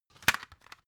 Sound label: cd cover open hard